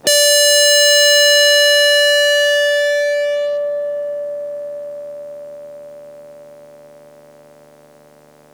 Blues Pick D5, Blues Guitar

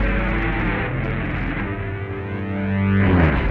Fat Loop, Electric Guitar; Sonic Foundry (Sony Creative Software) - Friendly Fire

